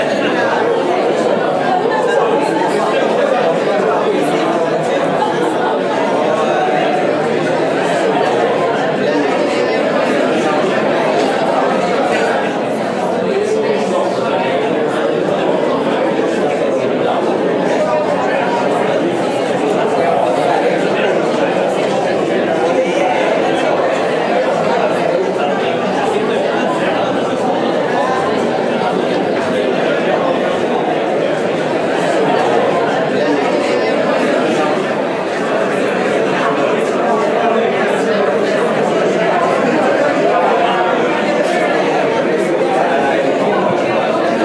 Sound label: Crowd